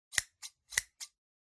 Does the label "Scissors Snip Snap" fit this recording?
Yes